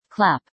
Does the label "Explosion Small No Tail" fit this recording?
No